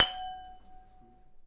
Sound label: glass glass, Crystal Glass Strikes; UNUSUAL KITS and FX